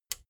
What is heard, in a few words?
plastic button small latch release